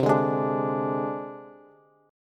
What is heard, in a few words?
C Suspended 2nd Sharp 5th C Bass; Csus2#5 strummed; JGuitar